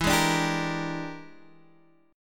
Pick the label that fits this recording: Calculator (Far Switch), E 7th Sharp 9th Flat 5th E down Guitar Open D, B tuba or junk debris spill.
E 7th Sharp 9th Flat 5th E down Guitar Open D